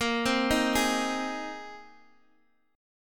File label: A sharp 7th Suspended 2nd Suspended 4th, Guitar DADGAD chord; down